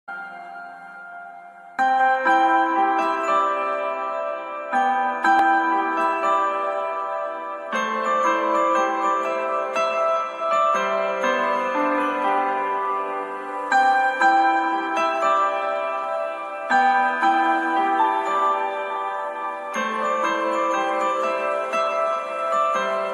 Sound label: pain in love bgm ringtone, tamil love; adithya varma